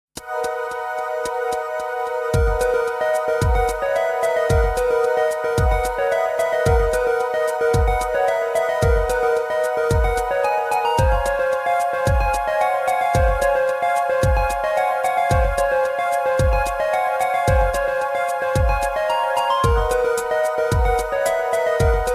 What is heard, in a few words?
trance logic